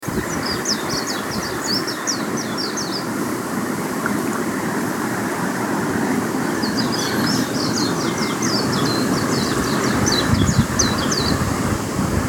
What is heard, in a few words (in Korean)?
새소리; 어떤 새가 '삑삐리삑삐 삑삐리삑삐'하고 연속적으로 울어요